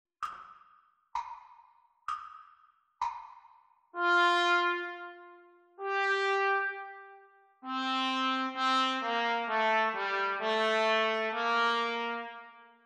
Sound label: Trumpet Duets Practice Speed; Grade 2